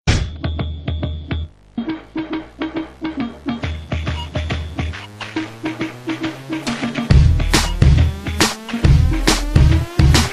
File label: Tech Melody